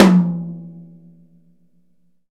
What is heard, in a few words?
Tom ff; Digital Sound Factory